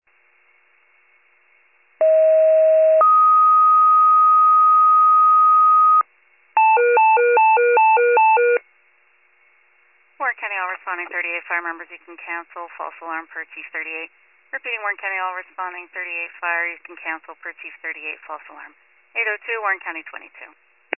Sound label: fire, pager alert